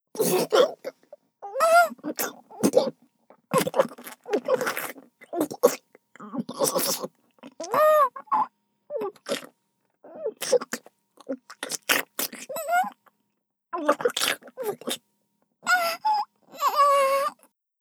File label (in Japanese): 射精